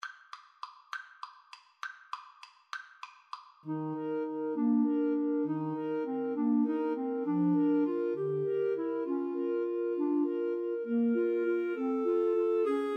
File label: Clarinet Trio, waltz